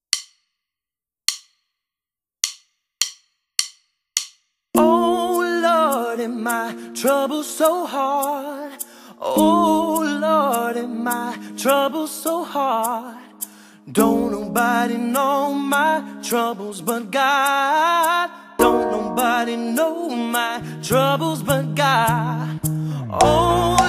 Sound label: click